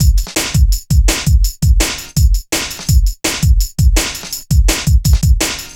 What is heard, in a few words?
Metallic Rolla, Drum Loops 166.5; Zero-G Jungle Warfare 1, 2 & 3